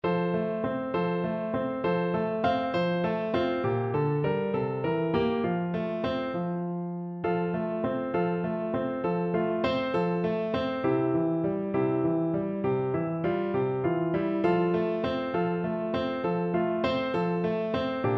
horn, French Horn

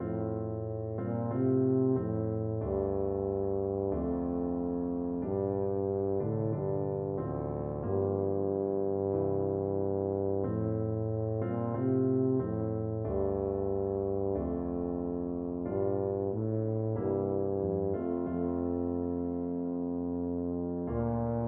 bleak midwinter, tuba

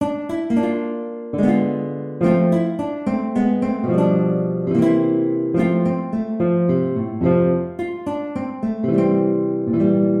guitar

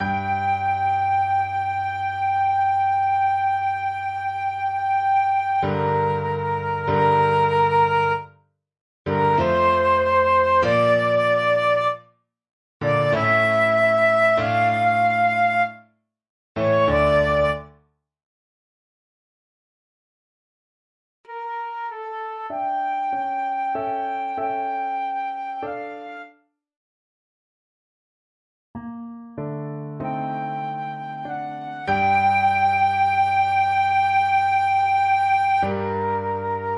Egmont flute

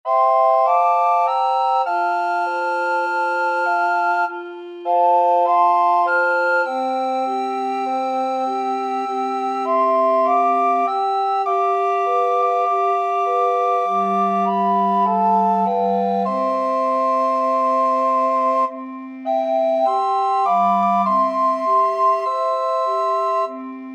recorder quartet

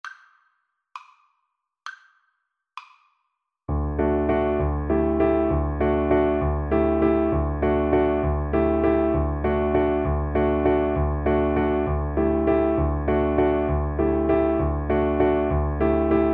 mauro giuliani op74 3, Viola

